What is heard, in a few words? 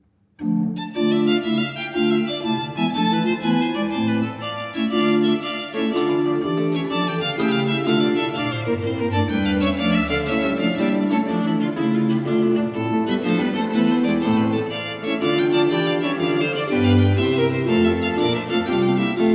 Sonate 2 in E minor - 4. Allegro; Archangelo Corelli; Arcangelo Corelli; Corelli - Complete Works; Klassika